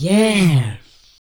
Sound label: VOX, VOCALS; Ueberschall; Jam Box